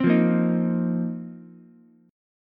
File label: A sharp Suspended 2nd Suspended 4th, Ukulele; strummed; JGuitar; 5,5,6,6